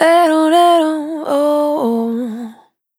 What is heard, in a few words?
Dry, Vocal Samples, Single Voice Melodic Loops; Singles